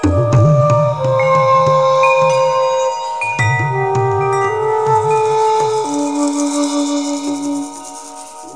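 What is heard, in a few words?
rhythm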